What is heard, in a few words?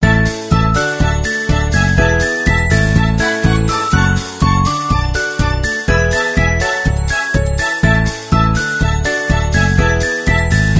music; icecream